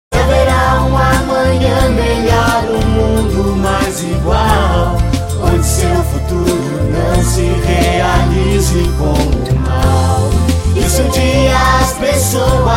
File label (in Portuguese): crianças